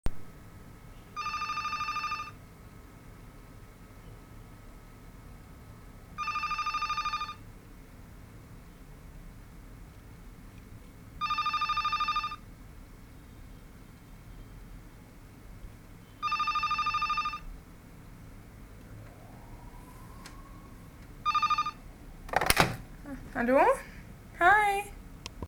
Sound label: Ringing, Phone Ringing